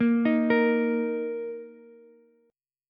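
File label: E flat 5th E flat, down, Ukulele; arpeggiated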